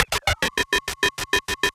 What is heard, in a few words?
Glitches; Antidote Advent